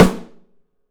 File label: PICOLO; 3D Drums